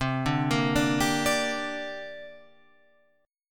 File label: C 7th Suspended 2nd, Guitar, Open F; arpeggiated; C7sus2; 12,9,10,9,10,9